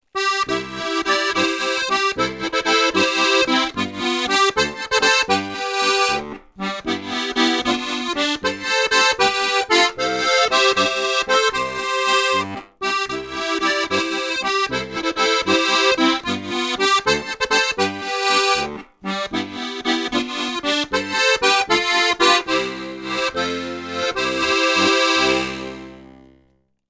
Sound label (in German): Tremolo mittel, steirische harmonika